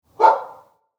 Barking Dog; افکت صدا حیوانات; Animals

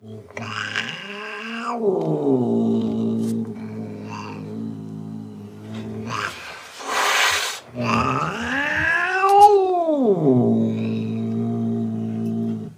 Cat Angry; افکت صدا حیوانات; Animals

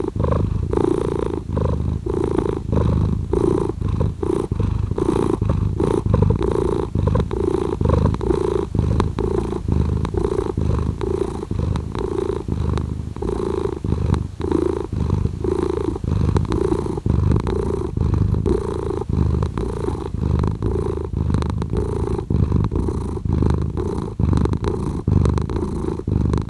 Cat Purring; Loop; افکت صدا حیوانات; Animals

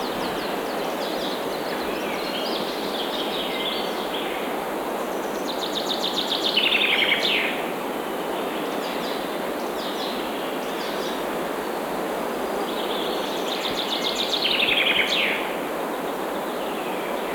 Singing Forest Birds (Loop); افکت صدا حیوانات; Animals

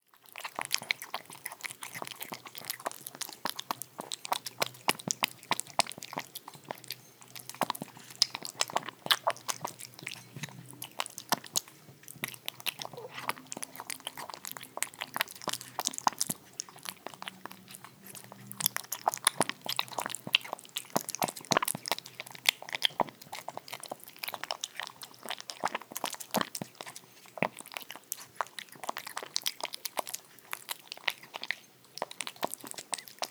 Kitten Eating Wet Food; افکت صدا حیوانات; Animals

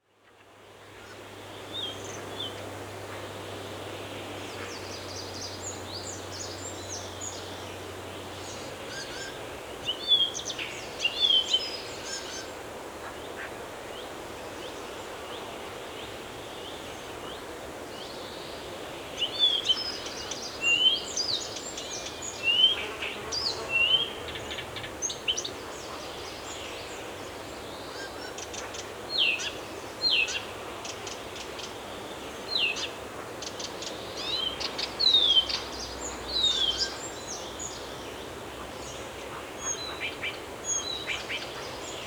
Singing Birds in Forest; افکت صدا حیوانات; Animals

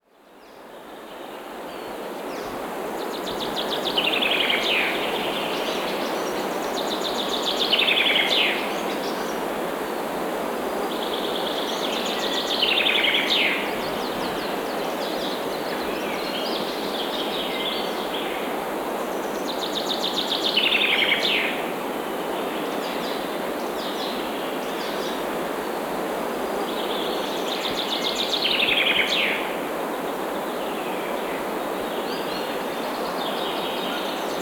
Singing Forest Birds; افکت صدا حیوانات; Animals

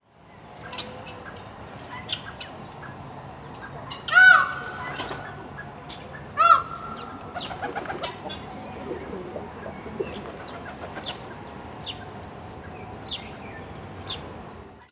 Peacocks and Birds; افکت صدا حیوانات; Animals

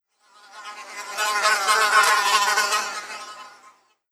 Fly; افکت صدا حیوانات; Animals

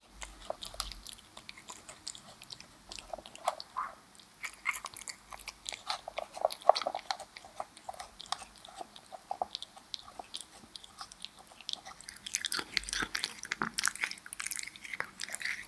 Cat Eating Wet Food; افکت صدا حیوانات; Animals

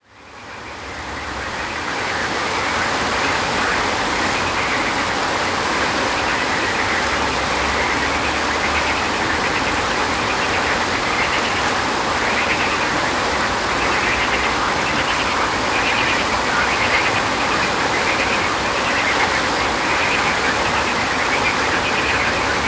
River and Croaking Frogs; افکت صدا حیوانات; Animals

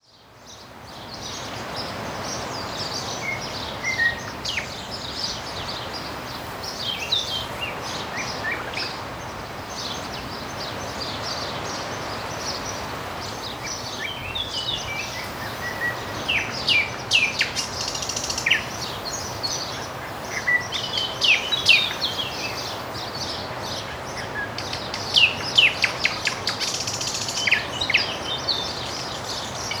Singing Birds in Seaside Park; افکت صدا حیوانات; Animals

